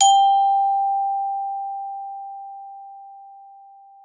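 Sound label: GLOF G3, glockenspiel; Xsample VOL-4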